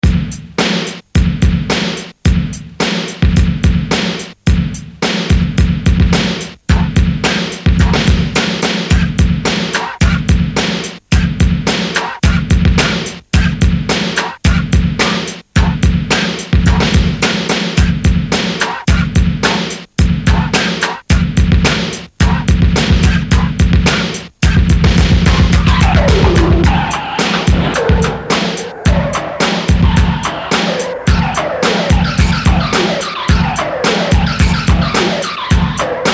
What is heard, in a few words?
Beat Box